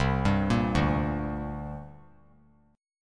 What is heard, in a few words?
C 7th Suspended 2nd C down; C7sus2 arpeggiated; JGuitar